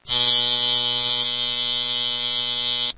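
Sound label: sound that cuts the ear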